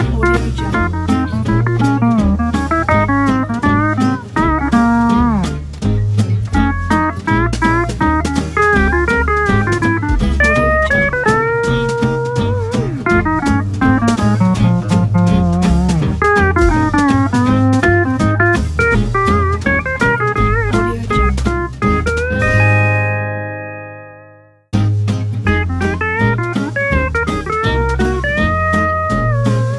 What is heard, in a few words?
west texas pedal steel swing; amarillo